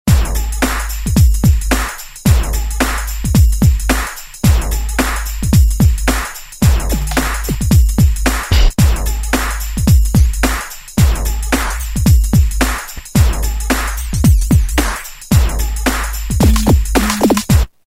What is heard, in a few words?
Swingin' 110, Roland M-DC1